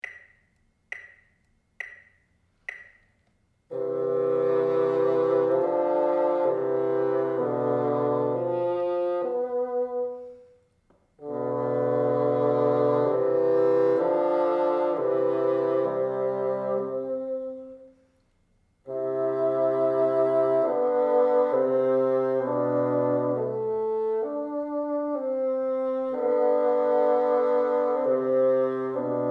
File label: together, bassoon